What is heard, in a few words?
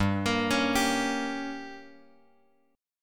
G Diminished; Gdim Chord {3 X X 3 2 3} arpeggiated